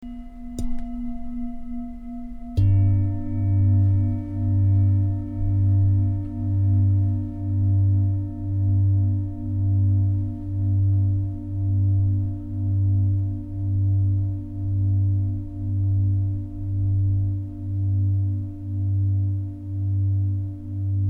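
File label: singing bowl; singbowl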